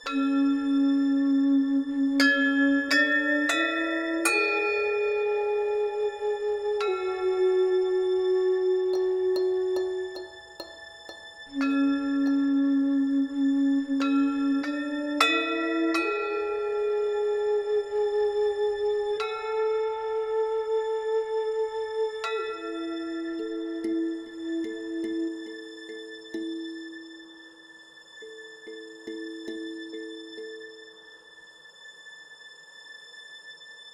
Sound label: Night Falls